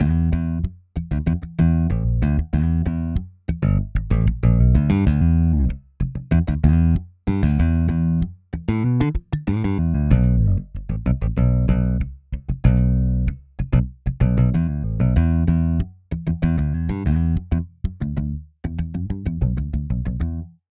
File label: Articulation Medley Modern Picked DI; Virtual E-Bass; Toontrack EZbass; Songwriting Tool